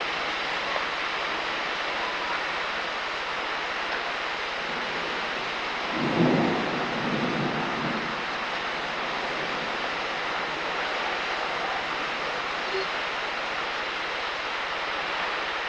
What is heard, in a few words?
bird